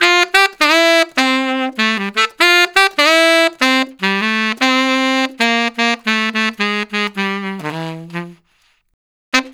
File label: Tenor Sax; Sonic Foundry (Sony Creative Software); Jazz Solos and Sections; Tenor Loops